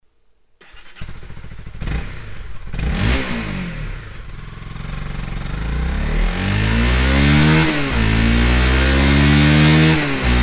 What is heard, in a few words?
stock exhaust honda cbr 250r; akrapovic